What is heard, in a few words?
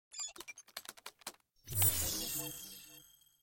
terminal denied